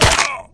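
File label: bullet hit flesh; csgom; cstrike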